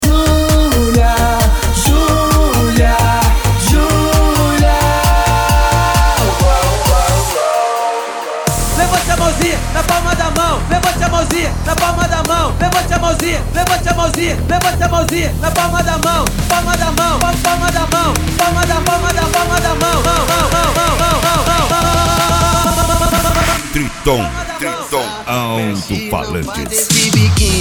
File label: mega funk